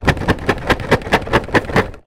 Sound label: leisure game box shake; zapsplat